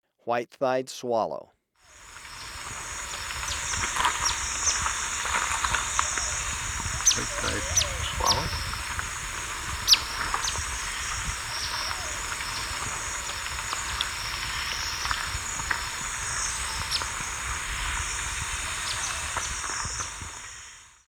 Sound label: White-thighed Swallow, Birds of Ecuador; S. America